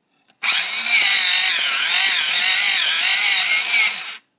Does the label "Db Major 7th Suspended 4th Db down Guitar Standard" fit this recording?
No